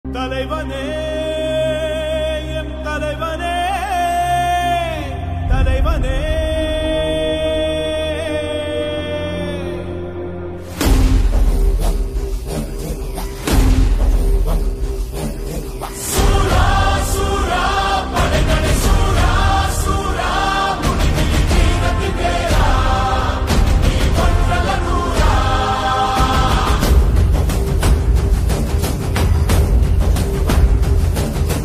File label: Song Bgm Ringtone; kanguva; tk music tech